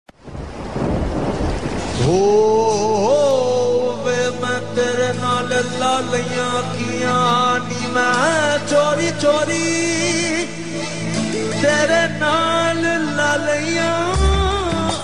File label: Song; Tv Serials; Zee TV